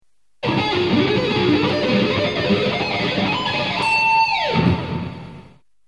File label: sweep